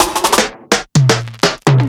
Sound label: drumfill 126 elevate; Wave Alchemy Loop Tools and Grooves